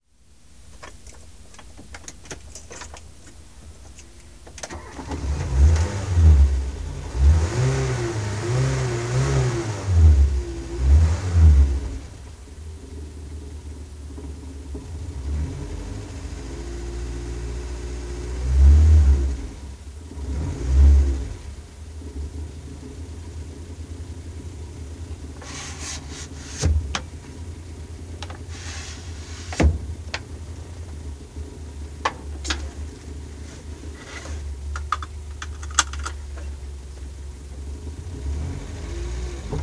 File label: R4 Gravel, Renault 4